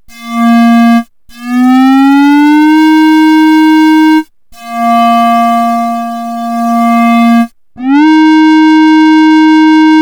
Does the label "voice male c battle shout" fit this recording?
No